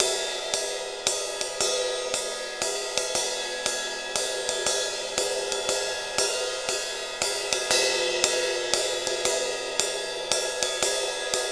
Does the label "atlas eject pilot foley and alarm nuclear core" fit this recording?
No